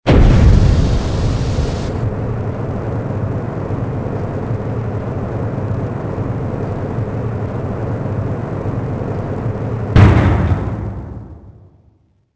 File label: elevator run; Soldier Of Fortune II Double Helix; XBOX